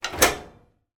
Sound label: household oven door open